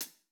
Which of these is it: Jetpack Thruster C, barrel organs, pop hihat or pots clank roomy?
pop hihat